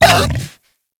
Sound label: Kubrow Feral Vocal Pain; Warframe